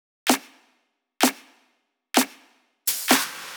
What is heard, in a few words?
Drum Loop 128BPM Clap; Loop Elements; VEC5; Drumloops; Multi Loops